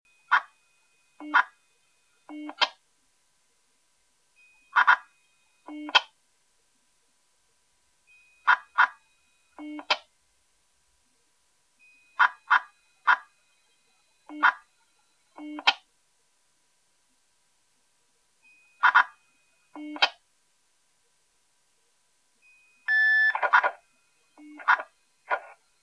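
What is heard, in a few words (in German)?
Feuerwehr FMS, Feuerwehrfunk; FMS-Datentelegramme; Statusmeldung; Spielhausen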